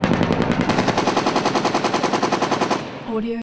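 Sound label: exterior outgoing gunfire heavy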